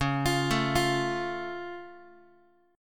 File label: Db Augmented, down, Guitar; arpeggiated; JGuitar